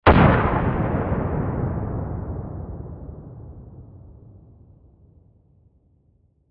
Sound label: Explosion Dynamite Demolition Blast Distant; Military; Warfare; Blastwave